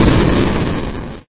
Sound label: Boom